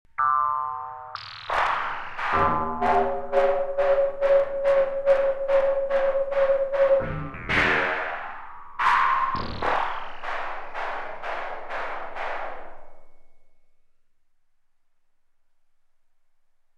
screechy, synth; analogsynth

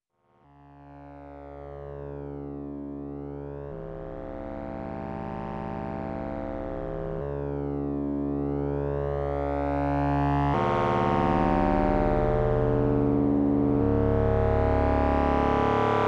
flange; synth; uhbik; analogsynth

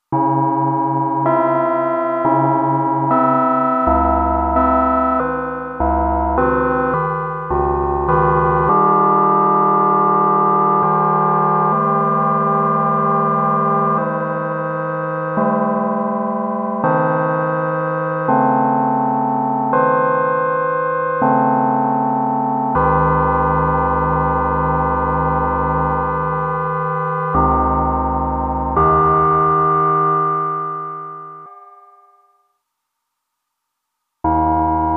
Chimes, synth; analogsynth

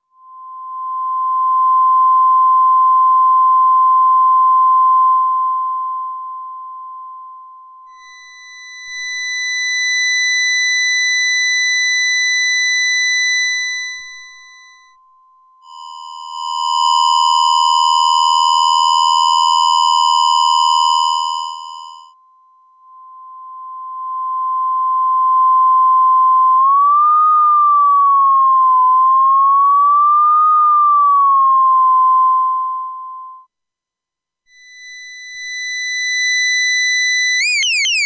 bug noise crusher, synth; analogsynth

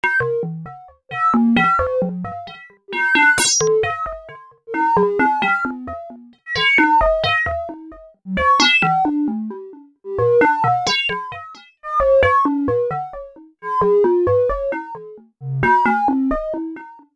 small glorp fm, synth; analogsynth

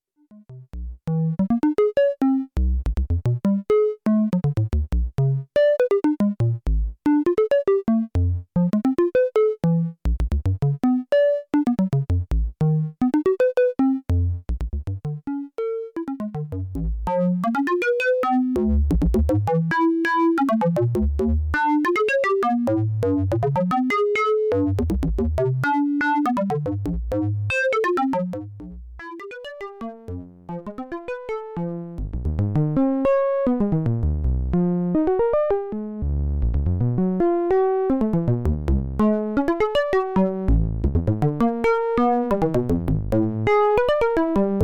waves, synth; serge; analogsynth

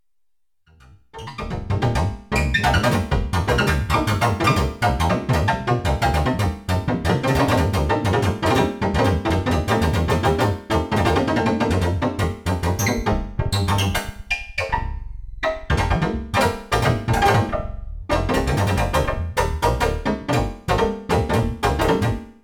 comb filter section, synth; duotussy; analogsynth

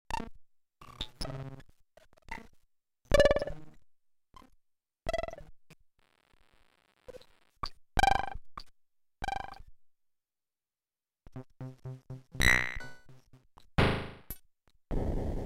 party horns, synth; analogsynth

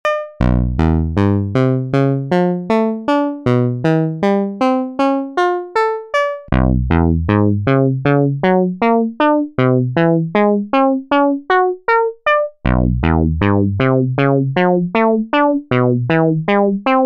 lo pass gate res; analogsynth

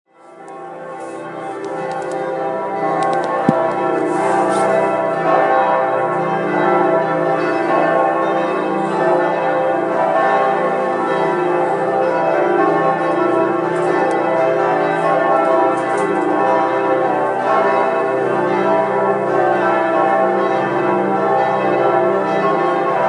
Bells Duomo Florence

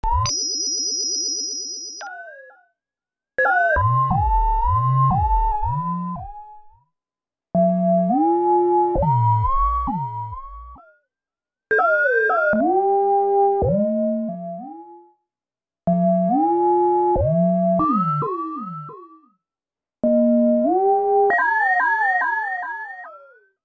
small glorp; analogsynth

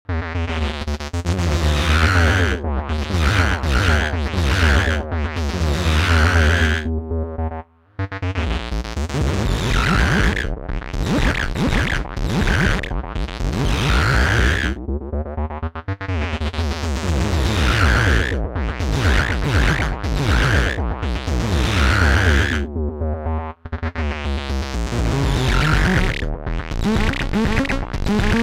morph mod, synth; analogsynth

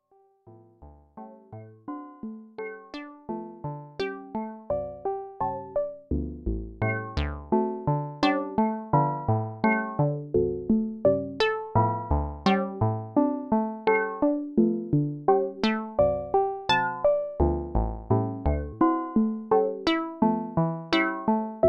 bluesy dry, synth; analogsynth

